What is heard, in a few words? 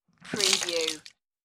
Water Splat